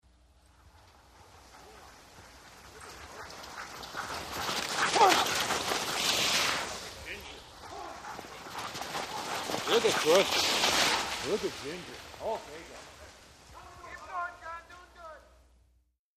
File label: Dog Sled 8 Dog Team By D; mvmt